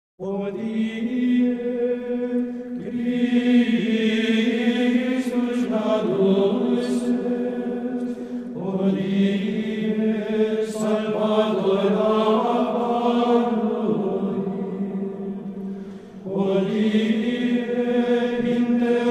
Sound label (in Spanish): Coro De Monjes Del Monasterio Benedictino